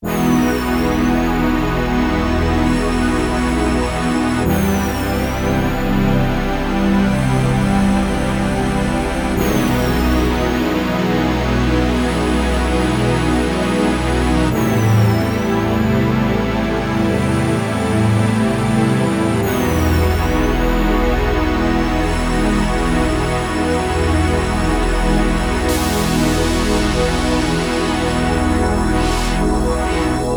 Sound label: Roland JX-1, Dual patches